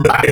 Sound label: Creeper, Spectral; Xfer Records Serum; Serum Presets